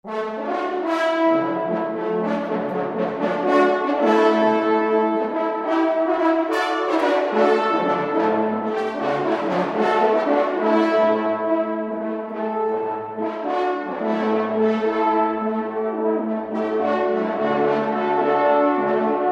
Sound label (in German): Fanfare; Hohe Pfalz